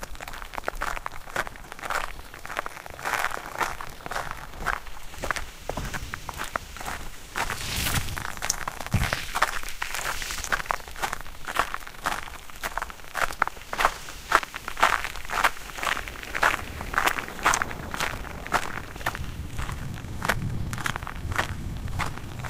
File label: a weary crow, post apocalyptic